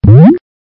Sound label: bounce